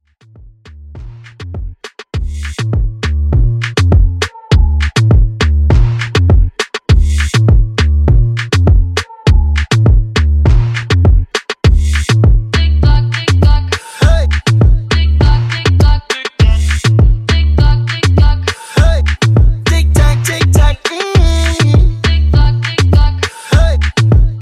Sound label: Top40 Dancehall Mashup 101 Bpm